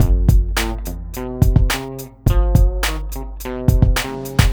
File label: twang, breaks